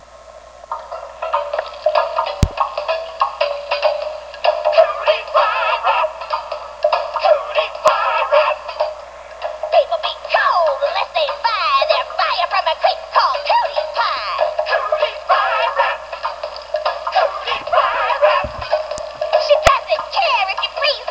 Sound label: Rap